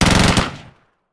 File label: Machine Gun; Sound Ideas; Guns